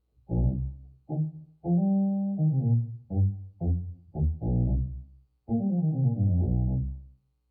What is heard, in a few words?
Reproduction of articulation Sub n up; Saxophone; Pitch Manipulation Pedals; tracking speed tonal reproduction